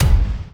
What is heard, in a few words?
Kinetic Impact